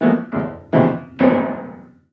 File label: piano mess; To the Moon